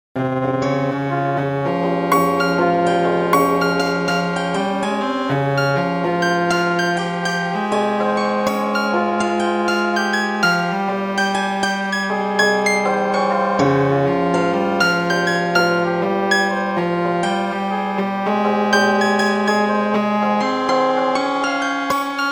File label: cos one over x vibrato